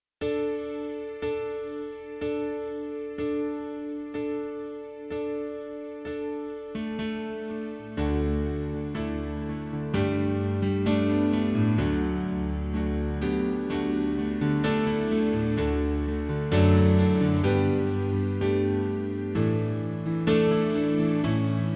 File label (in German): Pianoplayback D-Dur